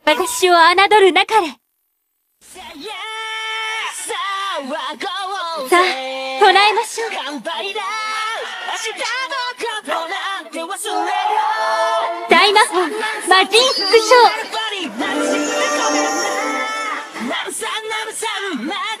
Vocals; 东方大炮弹; 東方キャノンボール聖白蓮スペルカード

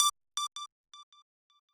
lead

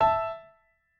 piano

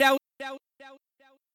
voice

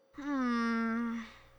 Hmmmm; Alice

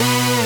synth

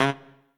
Trumpet melo

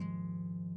harp

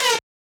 wobble

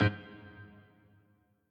piano riff intro

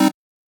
Chord

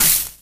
Hat; 音MAD; 孤独摇滚; 凉

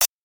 cym

